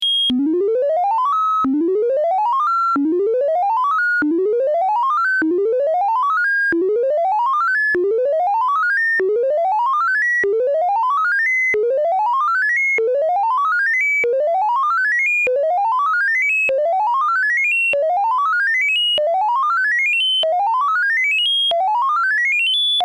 vco track with seq; analog synth

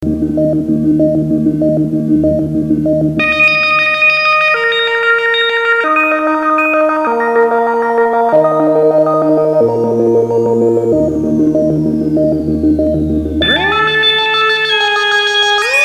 better tracking vco; analog synth

